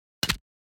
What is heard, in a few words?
BOW hits